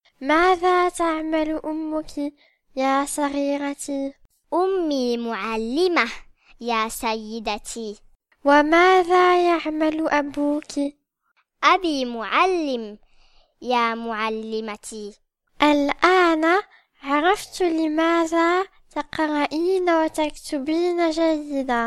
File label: rap